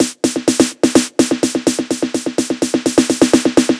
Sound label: Snare Roll Loop 126